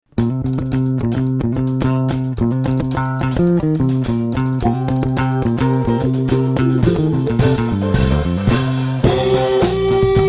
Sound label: Guitar Symphony